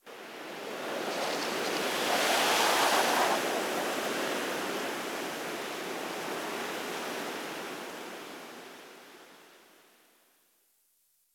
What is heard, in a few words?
wind leafy whistle; Call of Duty Modern Warfare